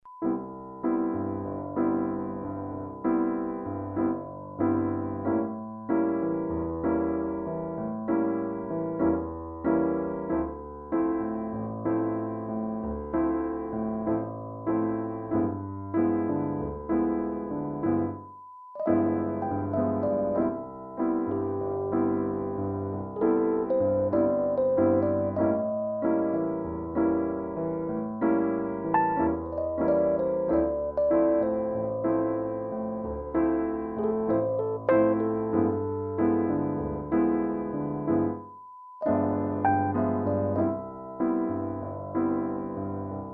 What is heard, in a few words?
artless piano